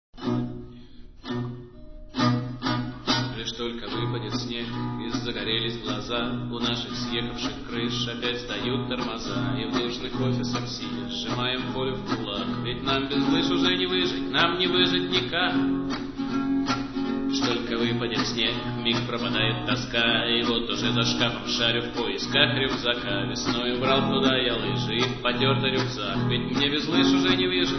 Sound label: rock roll